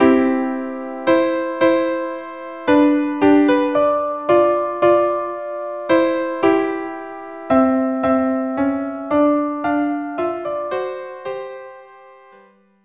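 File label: violin viola duo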